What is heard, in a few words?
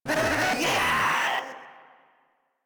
Proto Infested Runner Player Spotted; Warframe